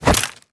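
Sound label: melee attack gun medium; viper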